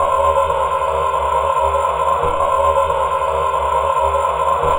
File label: Deep Choir; Sonic Foundry (Sony Creative Software); Loop Noir; Session 08 - Psychic Investigator